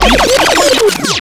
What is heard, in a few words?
backscratch, scratches; Zero-G; Freak Beats